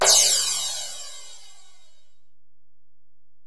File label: Ride, Alesis DM-PRO, Drum Machines